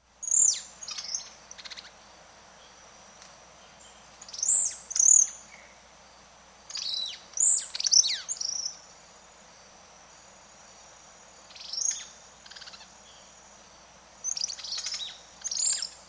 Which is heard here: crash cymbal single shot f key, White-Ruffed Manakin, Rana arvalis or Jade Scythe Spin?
White-Ruffed Manakin